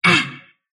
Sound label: sylph pain; dota; dark willow